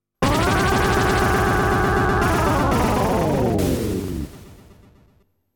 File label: Powerful Character Death SFX